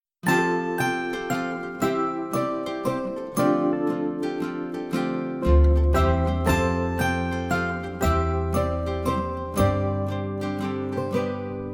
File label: Vocal